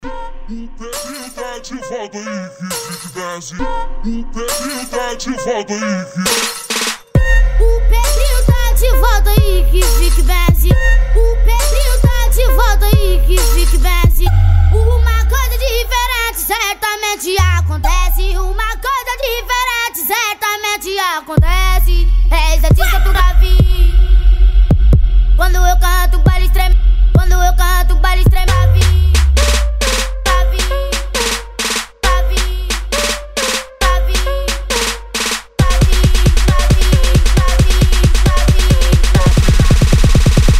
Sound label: trap funk